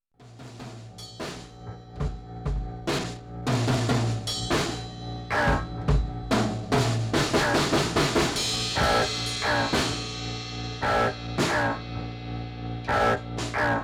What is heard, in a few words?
Some guitar w- delay